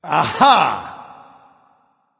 "ah", discover; smite; voicepack; ullr

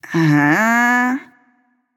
confused; smite; awilix

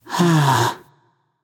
sigh; smite; voicepack; jingwei

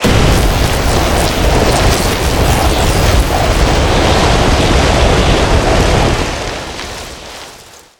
tornado; smite; kukulkan

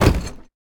death fall; Smite; Baron Samedi

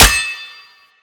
basic impact; smite; thanatos

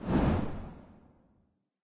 swish; Smite; Zhong Kui

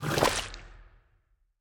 kraken slurp; smite; poseidon

